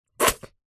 human spit; zapsplat

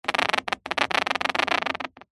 leather or similar squeak creak med; zapsplat

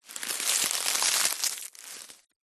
foley leaf large dry crunch crush break; zapsplat

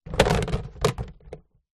sport soccer ball drop into plastic tub; zapsplat

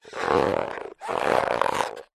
foley balloon small inflated rub handle creak; zapsplat

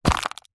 plastic disposable cup squash crush; household; zapsplat

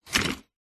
office chair set down tiled floor

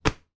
sport afl australia football catch hands

